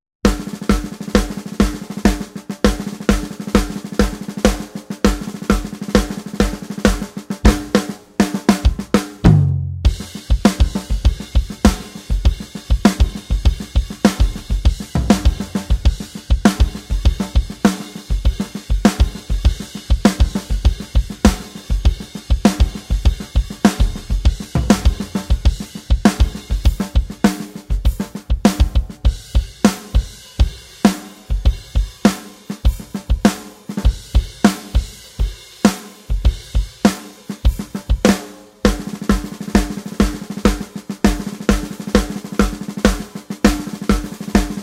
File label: Drums No Mix